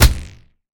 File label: hit; smite; cerberus